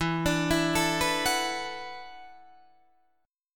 E 7th Suspended 2nd Suspended 4th, down, Guitar, Open D